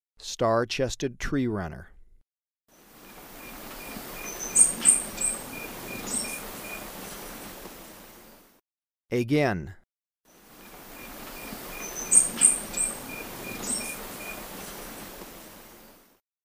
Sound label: Star-chested Treerunner, Birds of Ecuador; S. America